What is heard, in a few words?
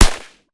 vityaz silencer, weapon; metrocraft